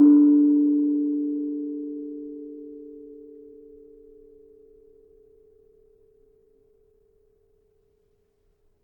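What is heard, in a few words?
Kenong 4 D, gravity harps tuned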